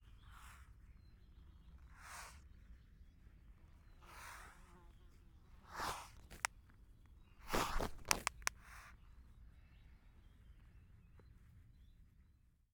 Long eared Owl Hissing bill snapping of adult female in the hand